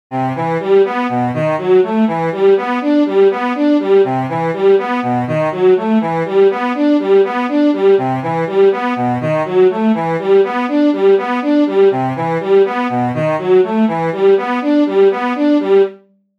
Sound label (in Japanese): Strings Arp, アルペジオで演奏されたストリングス; Arpeggiator; Logic Pro 11; MIDI FX; 和音を分散して演奏する